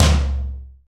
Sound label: acoustic tom sample c sharp key